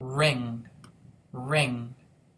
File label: ring